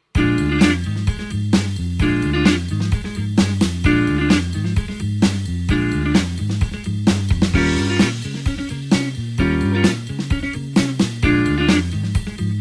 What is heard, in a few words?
blues 8beat backing note chord